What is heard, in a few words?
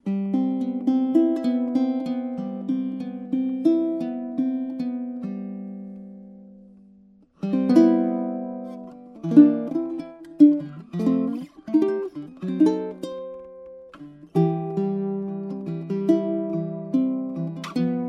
uke suwannee river